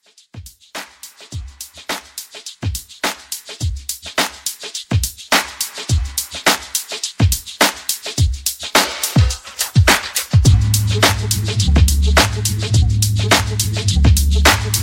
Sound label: 90s Old School Re Drum